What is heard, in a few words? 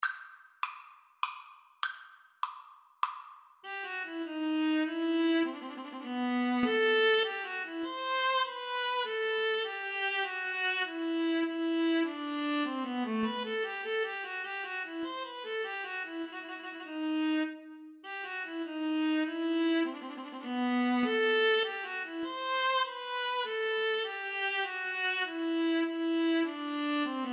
Minuet viola duet